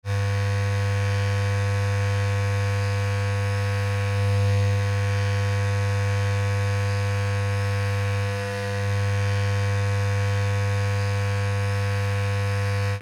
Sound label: Electric hair trimmer buzzing long